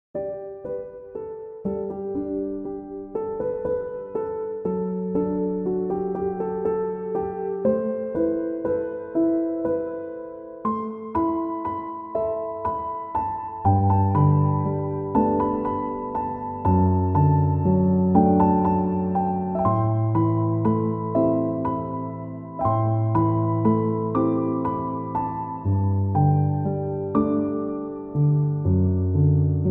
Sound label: chill piano instrumental